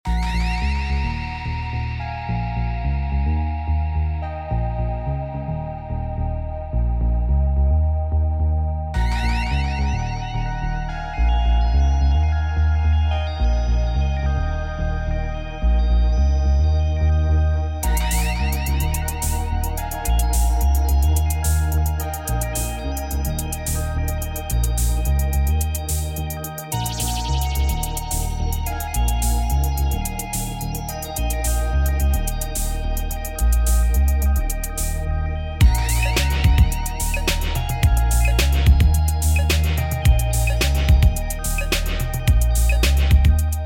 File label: Instrumental